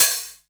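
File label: open hi-hat, Drum & Bass, 135 BPM; Ueberschall; Drum & Bass Resonance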